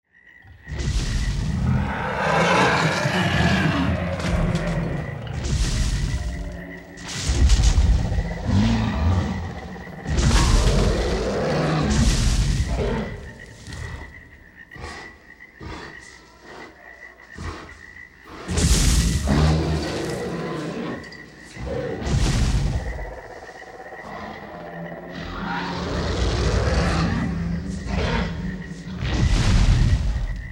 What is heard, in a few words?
jurassic soundscape; dippy-on-tour